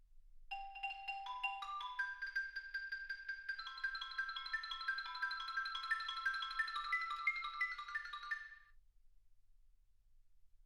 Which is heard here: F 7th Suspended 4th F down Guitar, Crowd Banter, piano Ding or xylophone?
xylophone